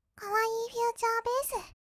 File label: kawaii future bass; 音楽ジャンル; 1200 kawaii voice!!